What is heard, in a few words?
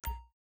popup, pause menu; gmod; paris